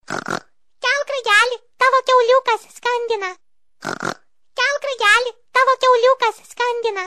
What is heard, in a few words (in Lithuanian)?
Kiauliukas skambina